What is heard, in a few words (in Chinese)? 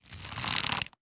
弩装填中段声